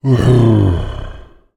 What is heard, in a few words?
giant male sigh groan; fantasy